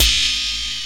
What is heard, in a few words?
Cympacular, EMU Proteus-2000